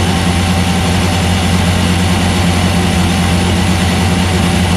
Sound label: V6T idle; AOS F1 2022